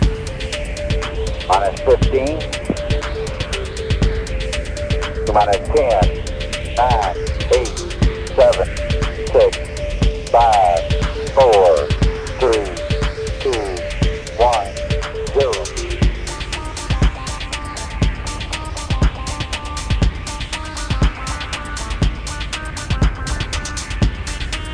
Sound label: with exterior ambience; Tintin Destination Adventure